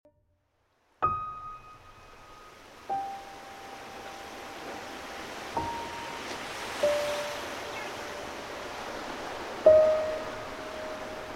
Piano & Sea

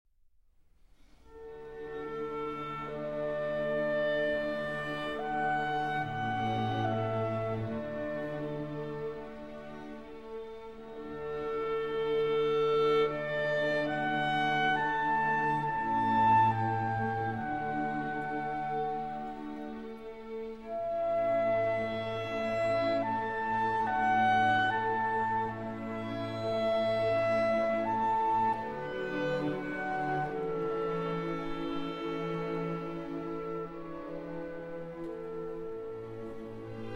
Clarinet Concerto in A Major; Mozart; II. Adagio

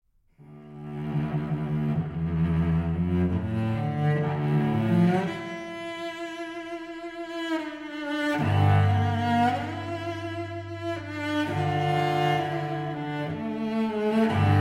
Cello and Orchestra

